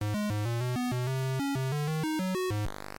Prison Exit, ZX Spectrum; Bubbler

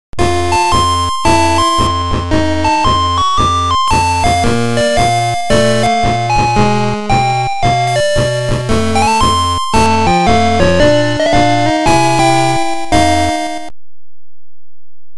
Game Over; DKL2

